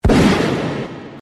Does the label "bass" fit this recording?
No